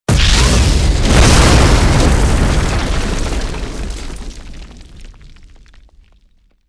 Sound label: explosion